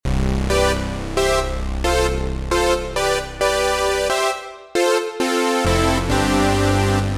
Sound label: Brass